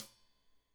Hat Tight, Deeply Sampled Drums Cymbals Stereo Hi-Hat